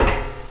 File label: grate